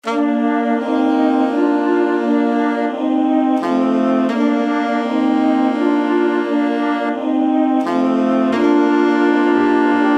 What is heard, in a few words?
silent night woodwind